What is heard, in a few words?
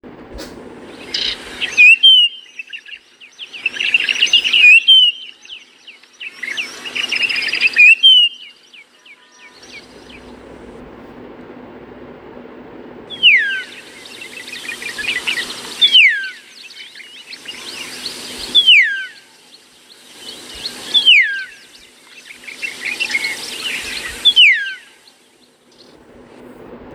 Common iora